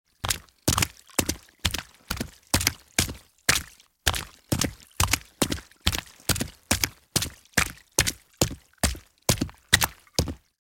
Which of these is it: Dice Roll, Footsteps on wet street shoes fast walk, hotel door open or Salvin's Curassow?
Footsteps on wet street shoes fast walk